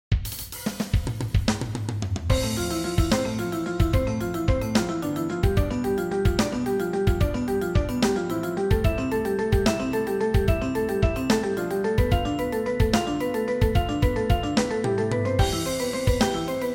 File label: funky